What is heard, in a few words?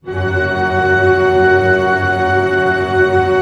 TREM F# PP, orch trem; Sound On Sound - Peter Siedleczek's Orchestra